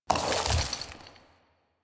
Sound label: Horse Brakes Trot; Foley Actions; Warframe; Vehicles; Duviri